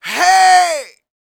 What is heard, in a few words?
Vocal, R&B & Dance, FEM. HUMAN